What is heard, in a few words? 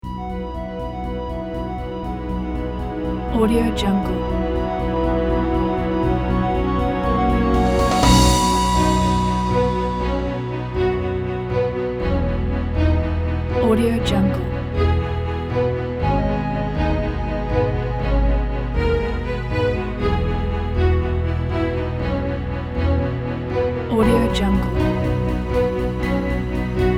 rise